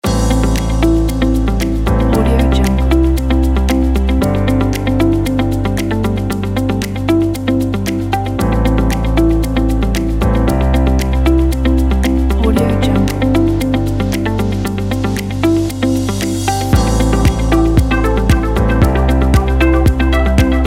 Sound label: a hi tech